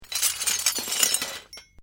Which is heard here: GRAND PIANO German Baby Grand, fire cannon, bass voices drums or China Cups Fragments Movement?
China Cups Fragments Movement